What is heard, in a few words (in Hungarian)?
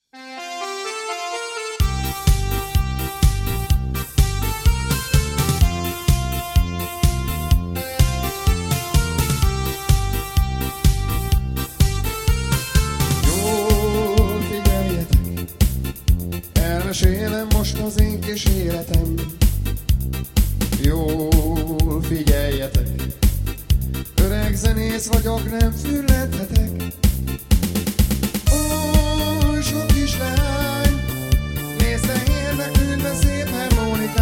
harmonikas